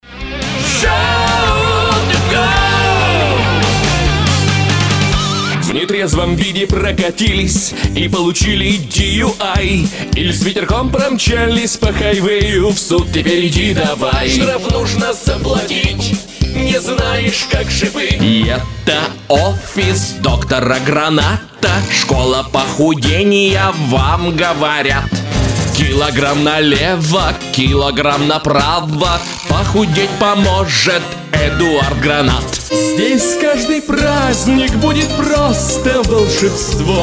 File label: voc rus